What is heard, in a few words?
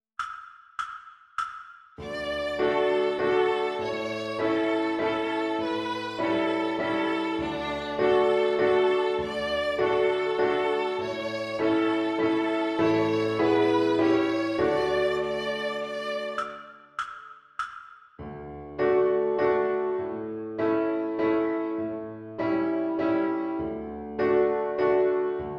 Waltz